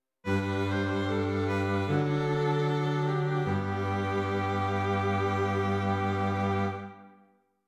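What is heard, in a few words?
String Quartet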